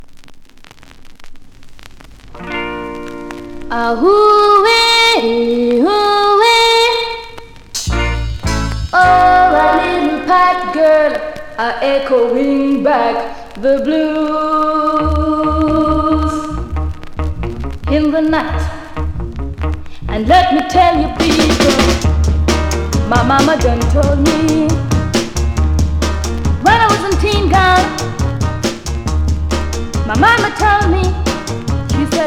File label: Jamaica Northern Soul Funk; GAY FEET